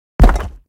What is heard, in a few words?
sym Drop; parthenon